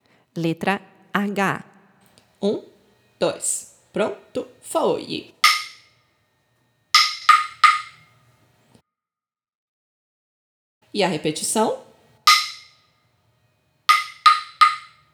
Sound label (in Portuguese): PERCEPCAO Ritmo Semelhancas e Diferencas